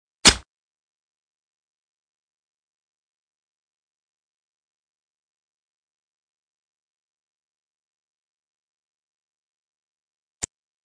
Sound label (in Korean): Fights, 싸움-전투; 헐리웃특수효과음